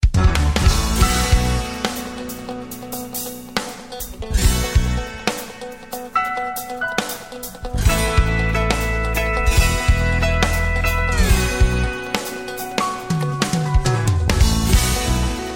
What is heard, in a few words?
country